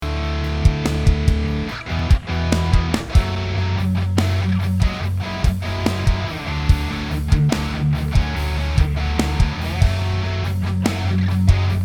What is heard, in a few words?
Pentatonic Tapping BTrack